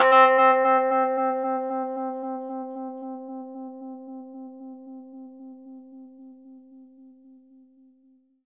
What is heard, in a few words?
Rhodes fast C, 3D Keys; 3D Sound; 3D Keys VOL-1